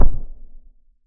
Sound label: hard thump; replicaisland